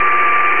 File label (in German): Schlitze; DEMOUK